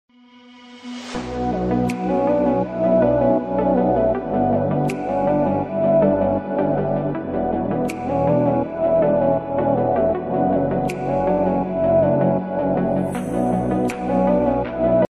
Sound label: motivation ringtone attitude boys ringtone remix bgm